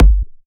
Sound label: Bassdrum